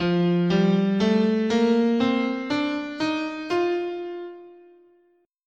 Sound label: F Mixolydian, scale